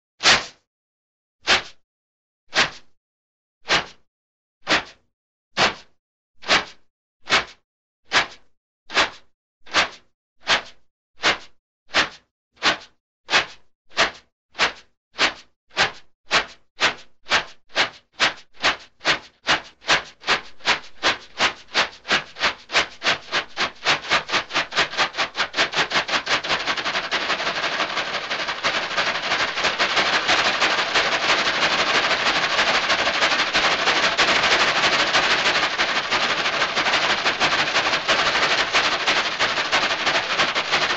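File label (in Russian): multyashnyi zvuk parovoza imitaciya poezda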